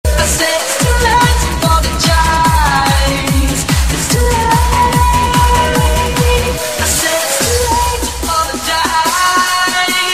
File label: win, music